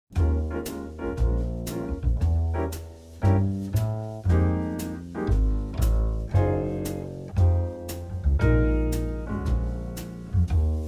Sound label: Relaxing Evening Jazz Piano